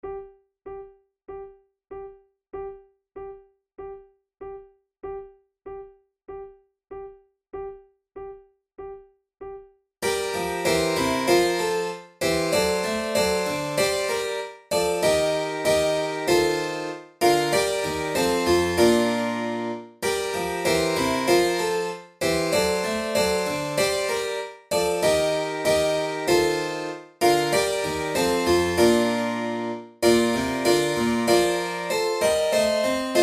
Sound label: tempo giusto